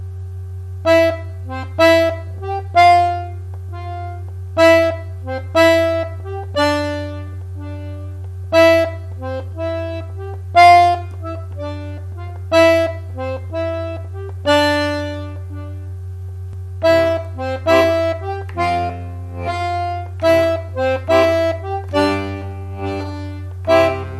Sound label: solfege accents scottish